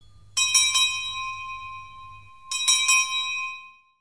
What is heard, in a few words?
cloches bell